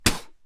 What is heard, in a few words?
spitting